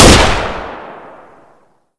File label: galil; czero opforce